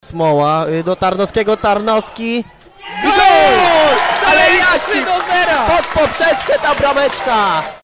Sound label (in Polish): 3 gol; Znicz Rybnik